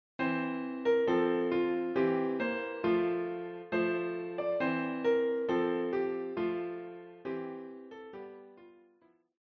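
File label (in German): Brüderchen komm tanz mit mir Klavier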